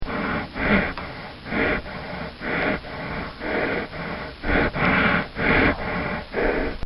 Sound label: A Horse Breathing; Horses; Animals; Bobby Cole